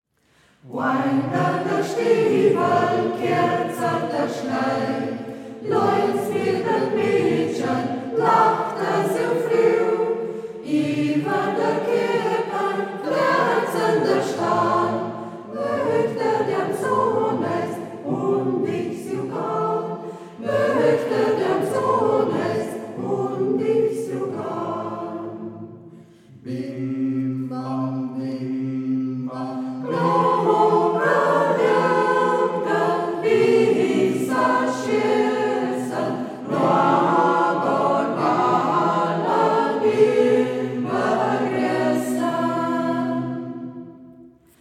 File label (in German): Singkreis; Ortsmundart: Braller; Angder de Stiwwle (Än der Silvesternoocht); Singkreis Kampestweinkel; Hegt wird gesangen; Josef Beer (Melodie)